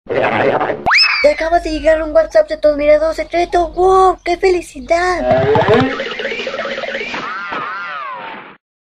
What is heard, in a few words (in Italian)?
Whatsapp divertente